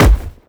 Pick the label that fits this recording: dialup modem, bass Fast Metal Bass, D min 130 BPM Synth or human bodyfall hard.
human bodyfall hard